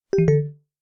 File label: Connect